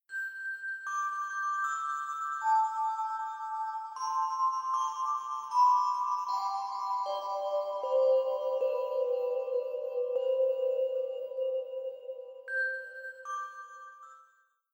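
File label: Future Bass Melody Celesta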